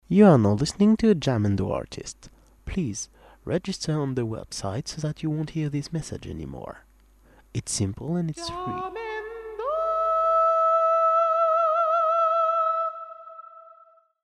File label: Jingle; Lacrymosa; Jamendo